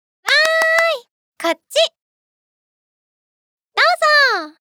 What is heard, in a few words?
Free Spin; netent